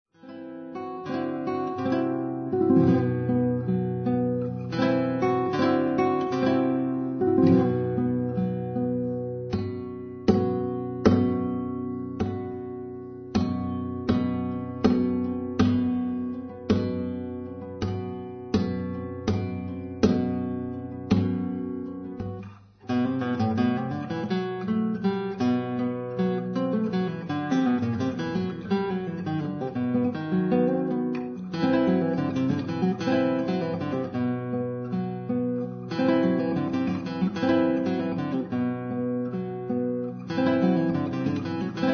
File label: siguiriya